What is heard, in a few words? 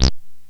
synt, Juno-2; Giga Samples Collection; Bass